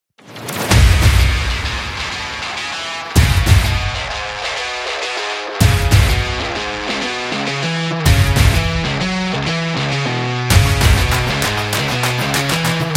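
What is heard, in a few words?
Energy, rock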